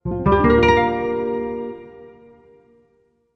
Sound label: Music Logo Guitar